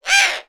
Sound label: animals bird owl european eagle owl squawk; sound effects one